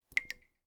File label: water drip into ceramic bowl pings